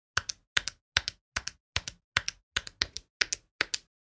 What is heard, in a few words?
Casio calculator press buttons